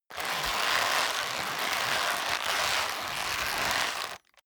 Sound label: leather creak long hard